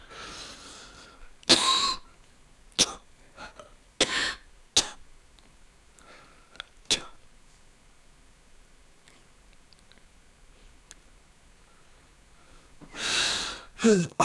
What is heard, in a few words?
young man hard b, pain; handsome